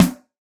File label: Rock Da Toms Snare